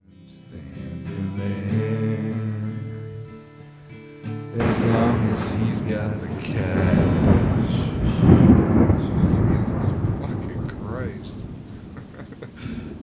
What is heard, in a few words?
thunder; end thunder outtake